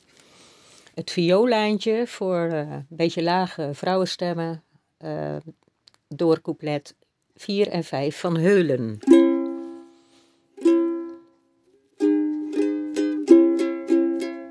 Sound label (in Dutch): vioollijn, Heulen ALT; repertoire